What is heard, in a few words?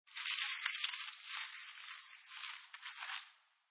Lves Crckle, Leaves being handled and crackled; Crackling; Objects